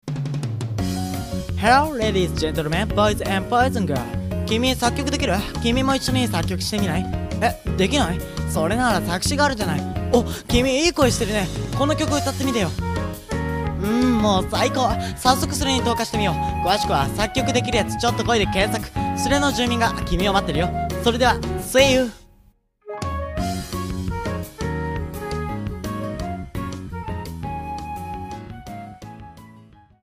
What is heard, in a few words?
bossa